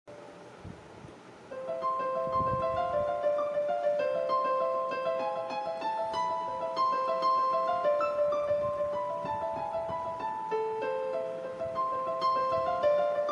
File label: BGM Piano Cover; kadhalar dhinam